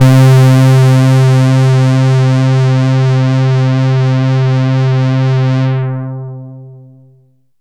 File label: synt, Juno-2; Giga Samples Collection; Bass